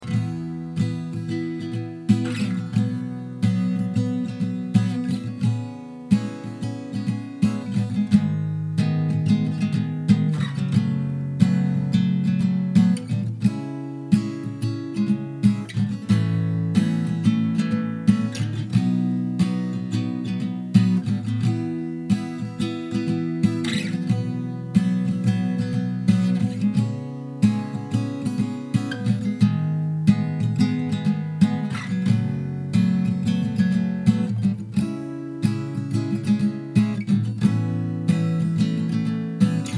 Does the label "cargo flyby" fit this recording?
No